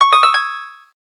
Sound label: harp, keytone